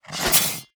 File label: lycanthrope strike